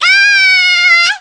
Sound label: Pikachu Scream; Brawl